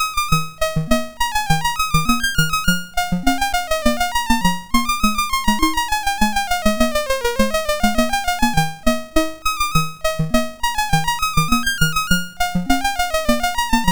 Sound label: sid MOS6581R2; Ocean Conqueror